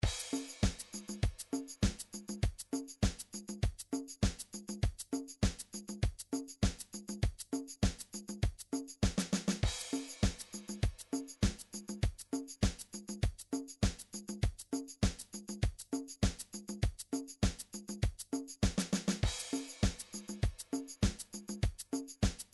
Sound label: midi drum patterns drums disco